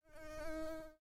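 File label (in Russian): писклявый противный короткий писк комара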